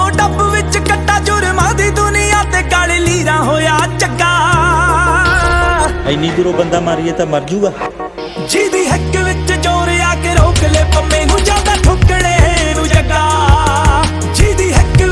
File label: Ringtone